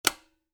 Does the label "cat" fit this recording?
No